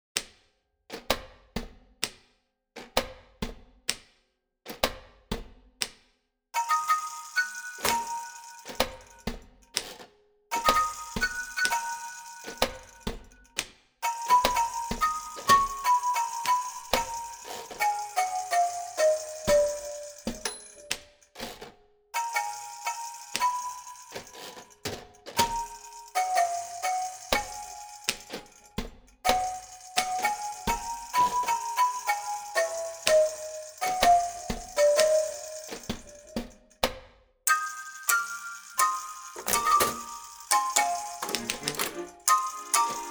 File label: typewriter tango